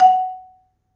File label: Marimba, F#3; Terkelsen